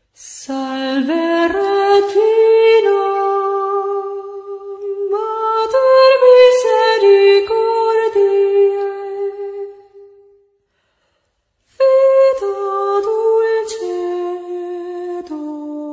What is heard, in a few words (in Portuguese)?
Salve Rainha, 450 Vozes; Pelo Fim Da Pandemia; 33 Paises; Gospel